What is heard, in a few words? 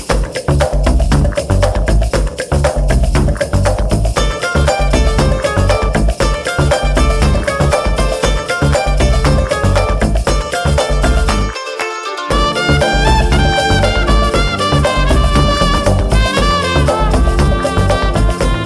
Music